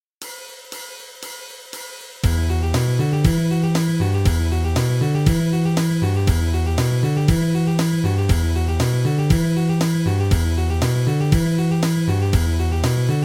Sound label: playback 120 bpm, guitarra; Estudo 02 - corda Si; playbacks 1 corda